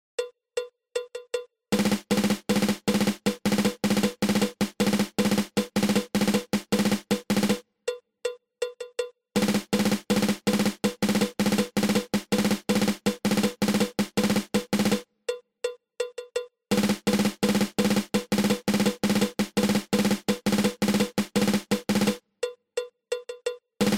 Single Stroke 4

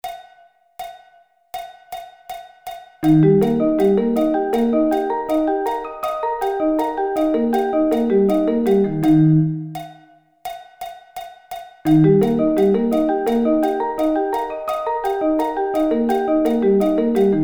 Eb Arpeggio Inversions

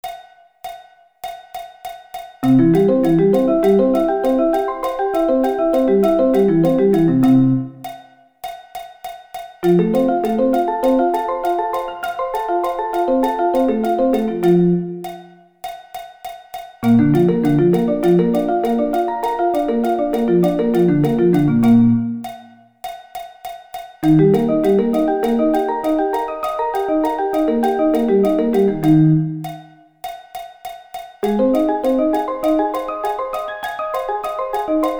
Arpeggio Inversions

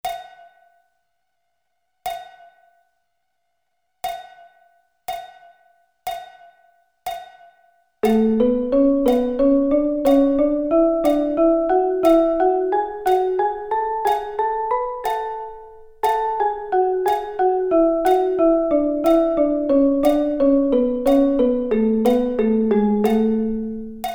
A Double Sticking

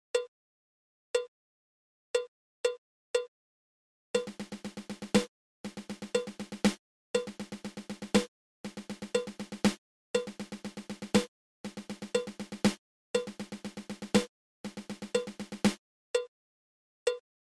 Nine Stroke Roll

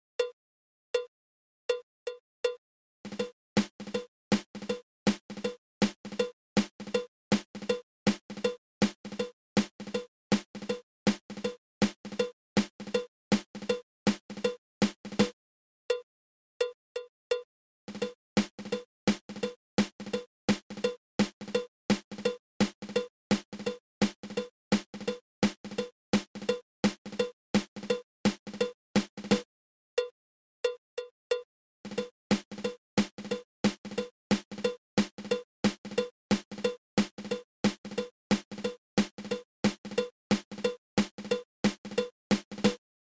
Single Drag Tap

